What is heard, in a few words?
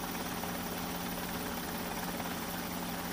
Propeller Loop